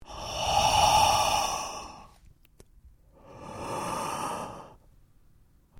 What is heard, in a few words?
Male Heavy Breathing Breath